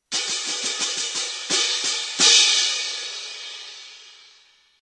cymbales; instruments

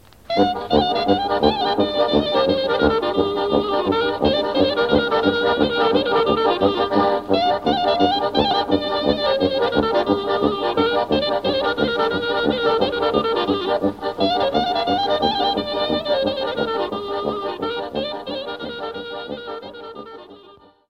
Narodno Horo, Bulgarie

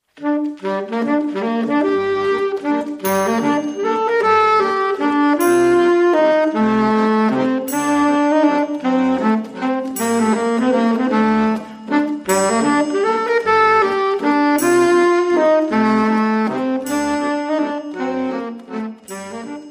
Valse, Belgique

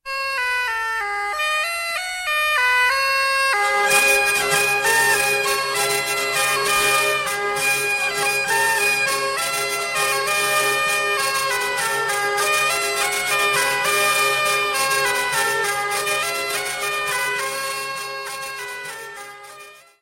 Cotillon - France - Limousin

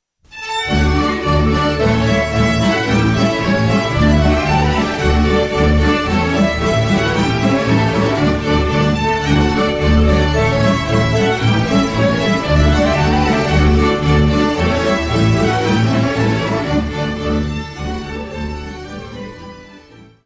POLKA - Danemark; POLKA ID DUR

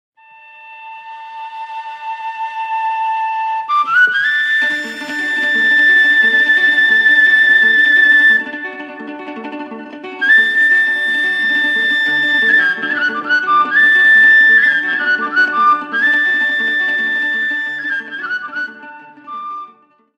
Shepherd's tune - Roumanie

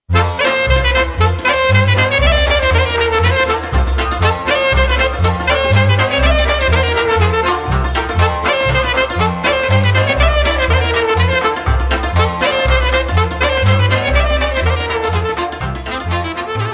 Chindia Ciobanasul Alunelul - Roumanie

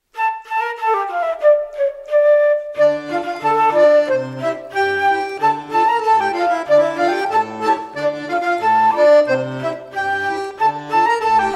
Scottish, Belgique; Scottish d'Anne

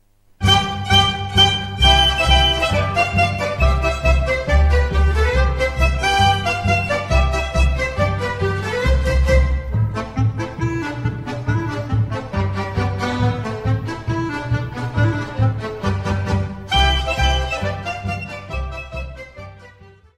Polka i Oberek - Pologne

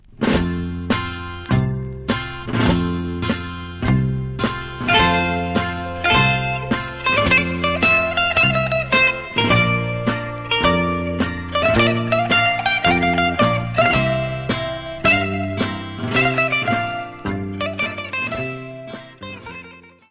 Syrtaki - Grece

